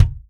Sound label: Real Drum Kit, ROCK KIT